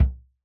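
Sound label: Bass Drum Jazz; Yamaha DJX-2B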